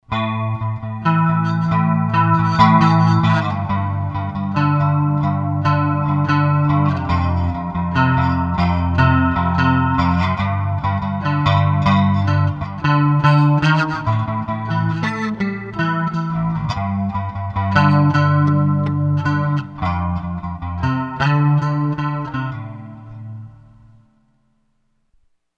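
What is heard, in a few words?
CHORD CHORUS, Zoom 506 II bass effects factory preset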